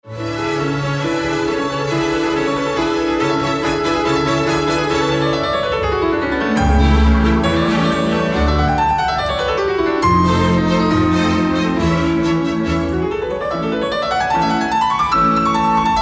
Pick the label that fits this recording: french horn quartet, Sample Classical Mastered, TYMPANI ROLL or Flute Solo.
Sample Classical Mastered